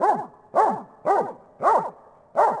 BARK; DAoC; Toa

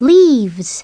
leaves

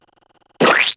squish; Crazy Paint: On Location

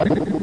gobble; party

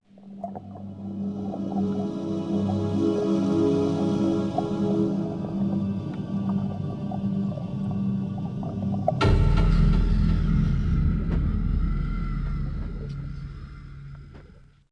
Abyss Exit Windows

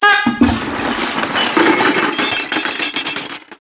flush

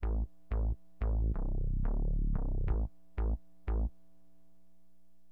BASS, HipHop; LiveAct Pool

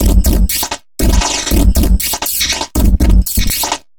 monkey; maudio; LOGICKAL

